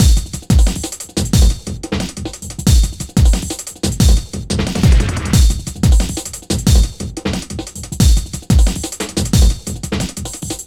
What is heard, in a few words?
Processed Beat, Drums & Percussion